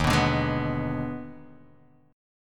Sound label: Eb Major 7th Suspended 4th Sharp 5th Eb down Guitar Drop D